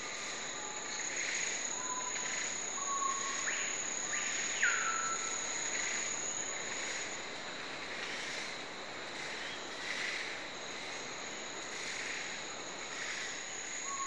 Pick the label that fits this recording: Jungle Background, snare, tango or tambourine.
Jungle Background